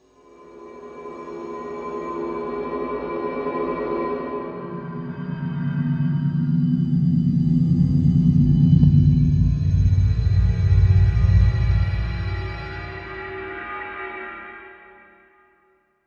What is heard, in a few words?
Space Time Fold, sci-fi atmos